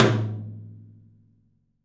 TOM, Studio Toms; 3D Percussives

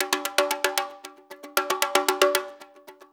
6-8 Conga Brush, Perc Loops

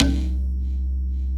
ETHNIC C2, Synth; ETHNICAL

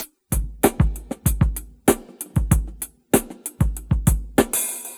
breaks

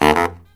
Killer Brass Riffs

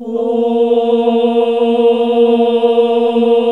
OHH A#1, CLASSIC VOX

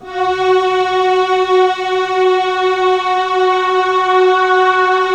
Thick Analog Pad, F#; E-MU Producer Series Vol. 5 – 3-D Audio Collection; 3DSynths; 3DThickAnalogPad

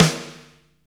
SNR, Tekno Industrial; East West

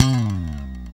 BS, E.Bass FX

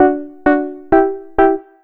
KEY, 130, 2 Step; USB Soundscan vol.51; House Side Of 2 Step; AKAI

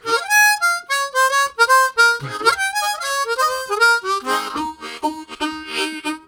Jump Funk, Harmonica Riffs; Zero G

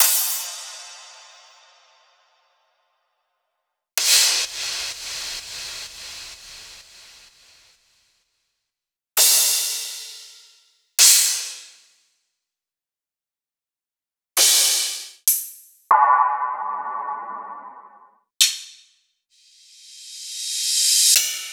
Two Shots Cymbal

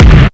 Tom Kit, LT; classic machines; SCI

